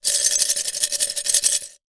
broken glass pieces inside glass jar shake; zapsplat

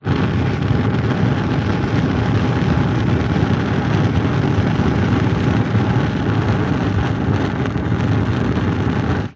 nature fire flames gas air blow movement rage

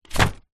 foley paper airplane land hit surface

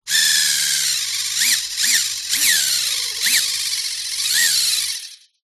science fiction robot motor server movements

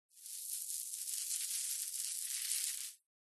household lipstick apply; zapsplat

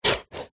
apple chop knife wooden chopping board

